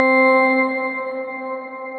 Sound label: Lead, Synthesizer Middle C Notes